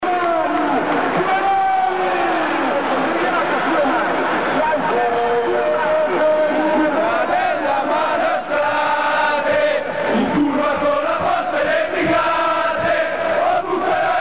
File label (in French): Allez allez allez Milan allez, Chants